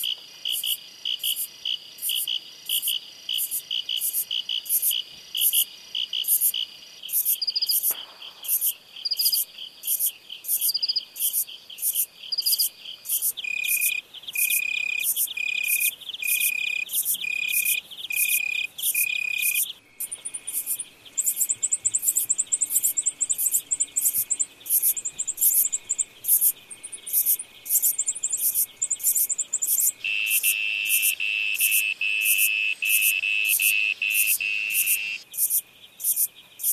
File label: crickets